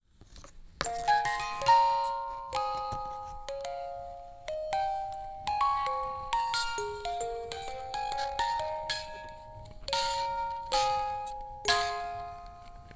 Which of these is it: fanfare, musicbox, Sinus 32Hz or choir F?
musicbox